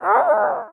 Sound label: mannequin grunt; headcrab classic, scripted; creatures